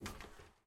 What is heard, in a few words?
Ladder Hand; Game rip; MiSide